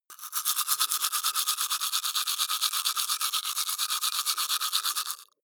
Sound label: teeth brush dry open molars